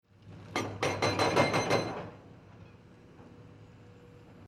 Industrial Quarry Construction Pecker Stone Breaker Digger Breaking Stone Short Burst; Construction Vehicle